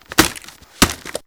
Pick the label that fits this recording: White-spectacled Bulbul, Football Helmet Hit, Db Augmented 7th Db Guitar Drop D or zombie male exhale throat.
Football Helmet Hit